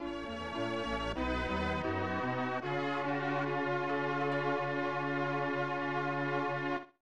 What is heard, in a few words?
Singing Voice; Melfand Stories; SNES; Singing Voice of Liberation